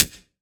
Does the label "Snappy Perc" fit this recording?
Yes